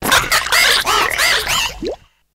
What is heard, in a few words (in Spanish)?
Grito de Quaquaval